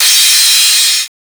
SUPER CRASH, PHUZZ DRUMS; Spectrasonic Distorted Reality 1

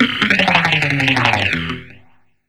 FX GTR, GUITARS KIT

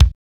real kick; NATUR. K1

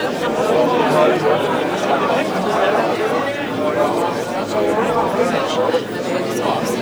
ATMO, 160 BPM, Drum & Bass; Ueberschall; Drum & Bass Flash Trackz

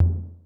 Miami Tom

